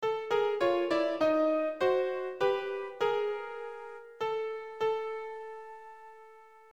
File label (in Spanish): acorde